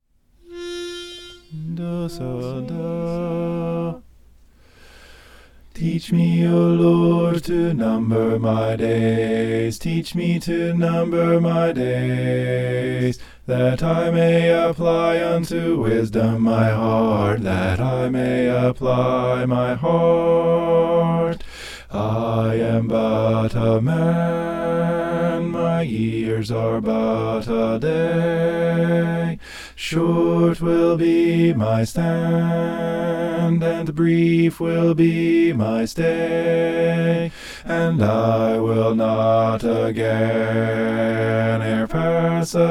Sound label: bass